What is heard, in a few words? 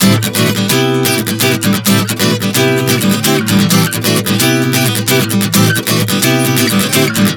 Am7-Bm7-Cmaj7 D11 130 bpm, acoustic guitar chord progressions